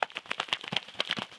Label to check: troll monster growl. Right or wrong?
Wrong